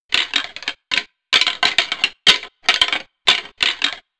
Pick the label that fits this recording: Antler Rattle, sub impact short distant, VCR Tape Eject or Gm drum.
Antler Rattle